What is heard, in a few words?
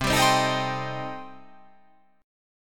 C Minor 6th C